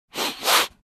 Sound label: Nose Blowing double